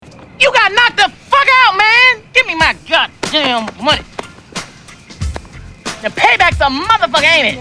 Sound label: Knocked Out